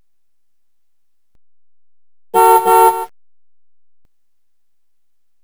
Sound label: car horn